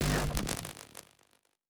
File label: Weapon Stop (Laser); Sci-Fi Sounds